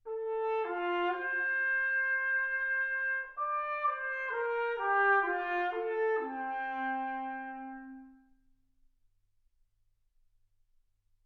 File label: trumpet